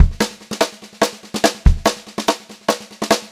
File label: BEAT, Pop-Rock Drumloops; USB Soundscan vol.37; AKAI; 90 s Sample CDs